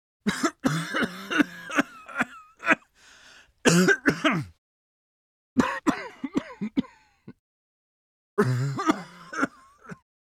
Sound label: Man Coughing Painfully